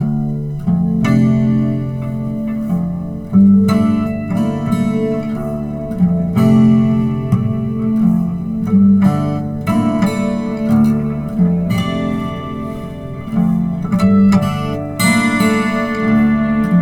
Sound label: Dm90 Acoustic Riff